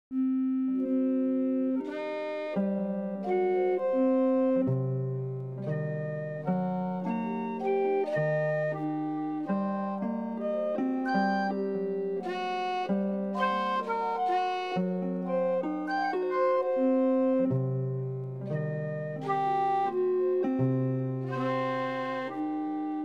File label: another with lots of blues notes; sevens; fractal music